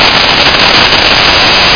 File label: tilt